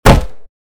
bullet impact wood heavy; gamerip; Out of Reach: Treasure Royale

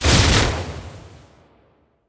Arcane Magus Attack Impacts; Linirea

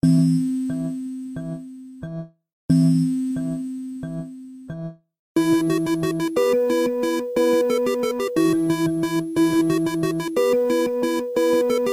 8-bit; BGM Reborn; Opal

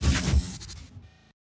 Sci-Fi Mechanism; Sci-Fi Weapons-Source Sounds; Robobeat

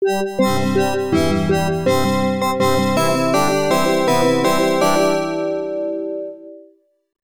Level Clear; Passing Shot; System 16B; arcade; Australia (Level 2)

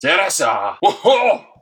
Cry; Xero

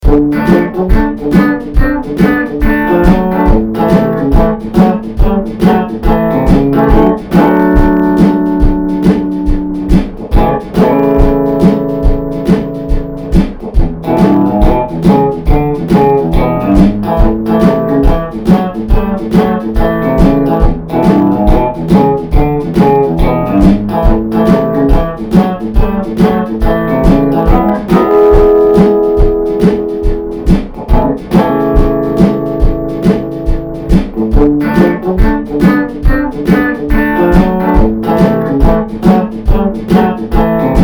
Country; Echo Route 65

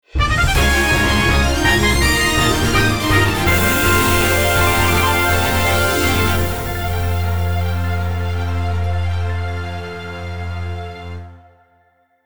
Mode Clear; Super Smash Bros. for Nintendo 3DS and Wii U Vol 29. Super Smash Bros

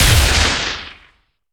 Sci-Fi Weapons-Sniper Rifle Single Shot

